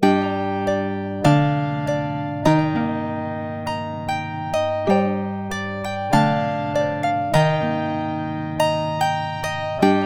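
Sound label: Keys Loop G minor 98 BPM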